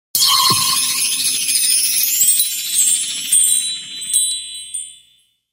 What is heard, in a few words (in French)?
AMBIANCE; pack noel; SOUND-DESIGNS